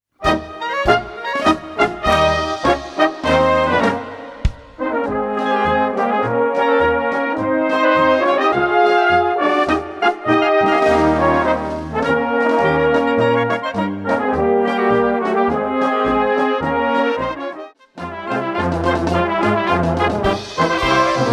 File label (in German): Blasmusik